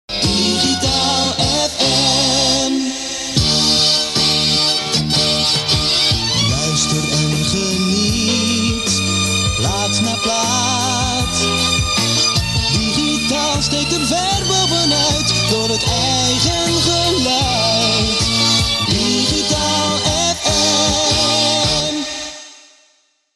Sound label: Stationsong; Digitaal FM